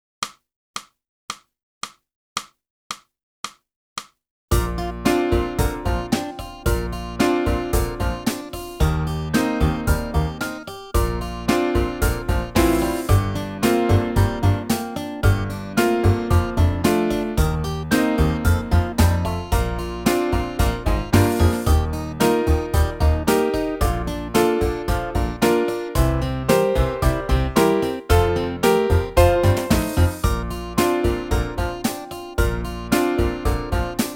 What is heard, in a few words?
sax